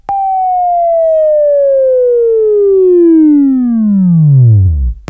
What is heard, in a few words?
Buzz; Labview-Program; Sweeping Training program